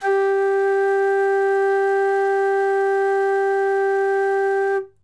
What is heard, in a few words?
Wodden Flute G2